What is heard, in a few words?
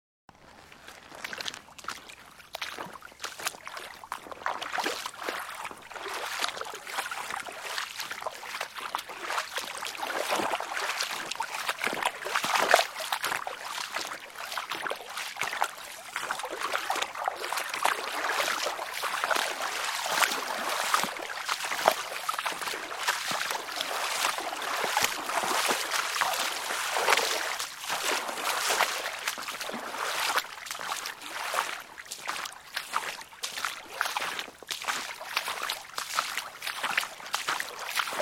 Walking Through Water, Footsteps wading through water in a stream; Human